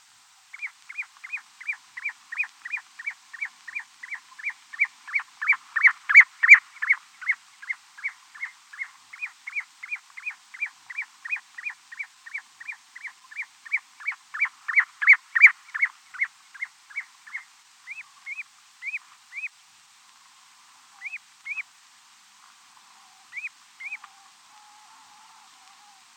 galstuchnik golos

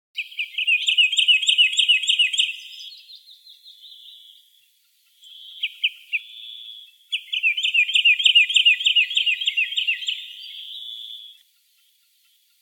fifi golos

